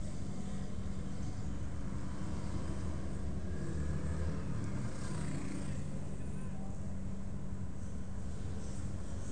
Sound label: ROOM